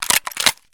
rem870 pump, shotguns; 12cal; boito

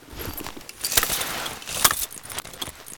at4 prep, AntiTank; launchers

